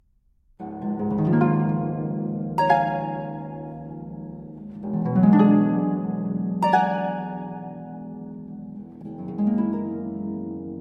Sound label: ballade